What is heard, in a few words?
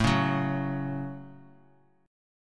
A 6th, Bass Standard; strummed